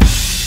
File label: Open Hat